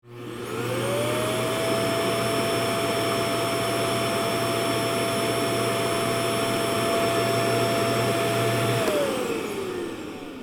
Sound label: Vacuum cleaner start run and stop; cleaning